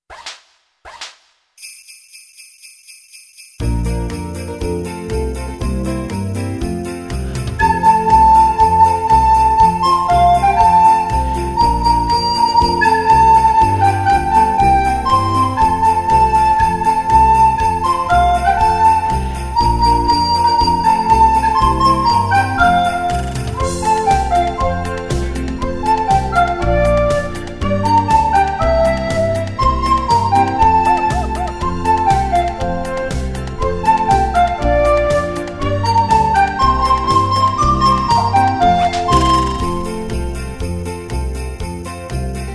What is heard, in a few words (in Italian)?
jngle flauti